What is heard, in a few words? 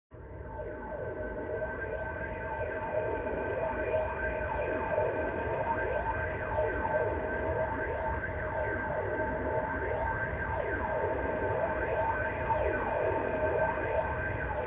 Retro Anime Jet (Low Far)